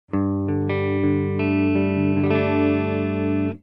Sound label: Beyerdynamic M260 Clean (Neck), guitar